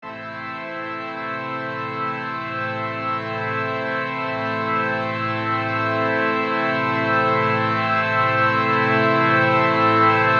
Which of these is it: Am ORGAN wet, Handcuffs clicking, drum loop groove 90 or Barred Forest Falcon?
Am ORGAN wet